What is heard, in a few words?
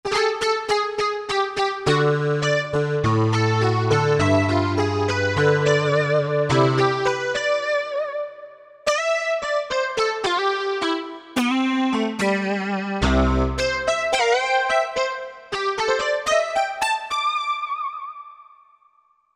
Super Pluck, Korg MSC-12S Synth; Synth 3